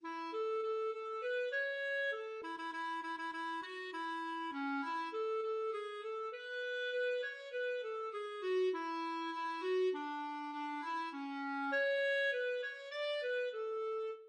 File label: mambru se fue, clarinet